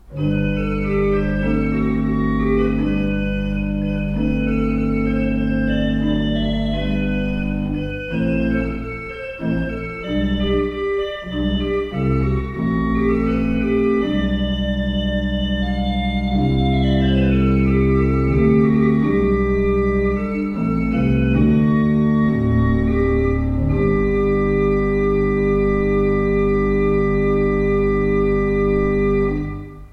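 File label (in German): Orgel, pfeifenorgeln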